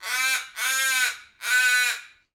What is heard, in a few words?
bird crow call caw squawk close; Gamemaster Audio; Animal Sounds